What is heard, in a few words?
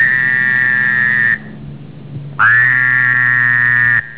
fowleri, amphibians anura